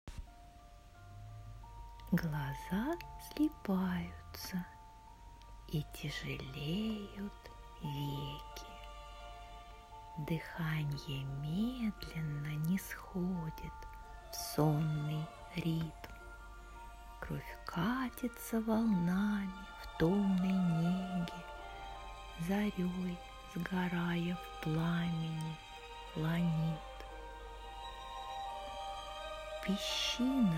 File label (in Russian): Колыбельная для взрослых